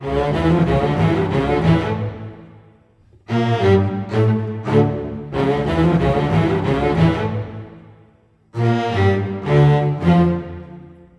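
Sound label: D minor, Low Strings, 90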